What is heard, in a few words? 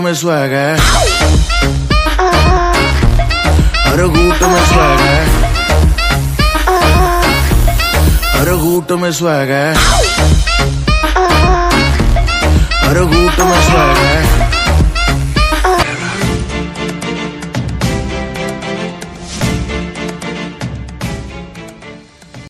instrumental bollywood english ringtone